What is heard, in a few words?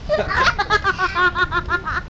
laugh